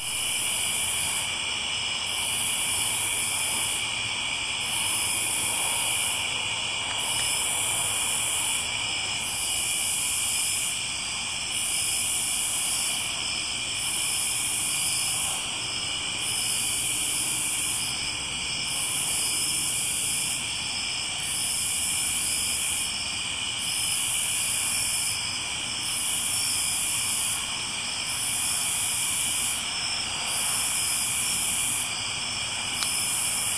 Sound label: cicadas in missouri